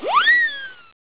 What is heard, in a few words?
WHEEEEE